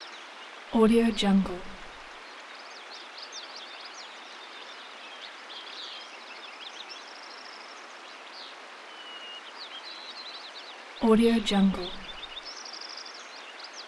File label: forest river